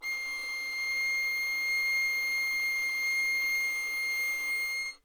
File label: violin